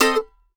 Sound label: MANDO 7TH F7; Sampleheads; Whole Lotta Country 1 & 2